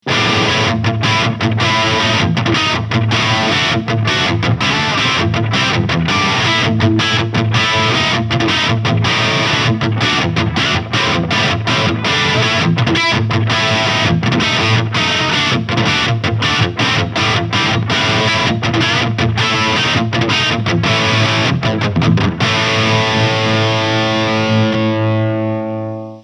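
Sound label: LTD SC 20 Lead, guitar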